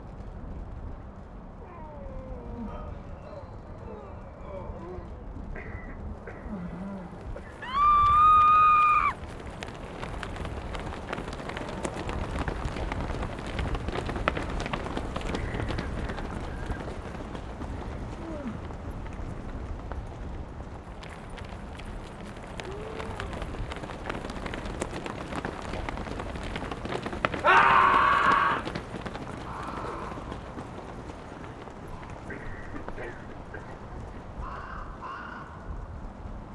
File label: desperate escape, ambience; pf2e; hellbreakers